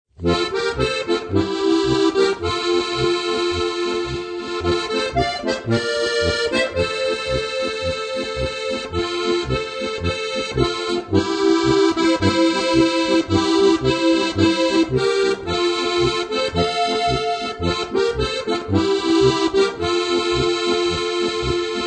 Südböhmische Polka

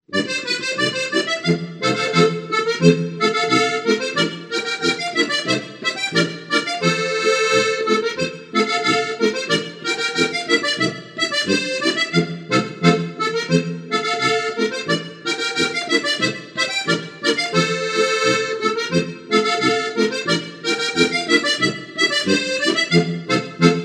Okarina Playalong

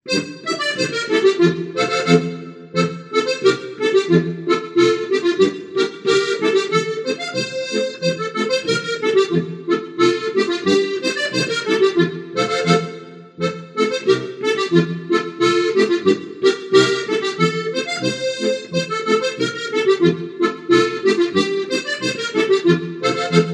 Polka Playalong

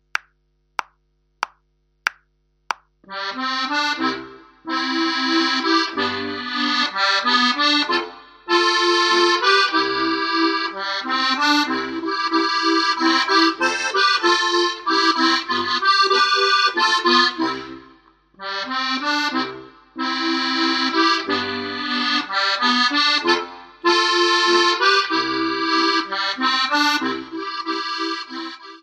langsamer Walzer (1. Stimme)

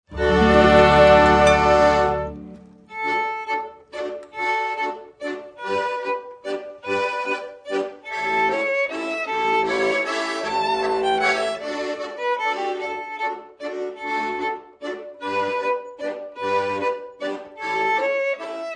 Finnischer Walzer

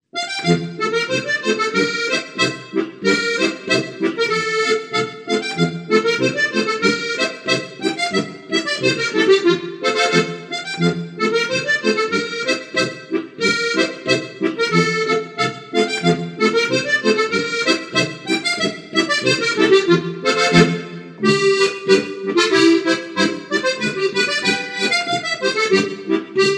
Gundi-Polka Playalong

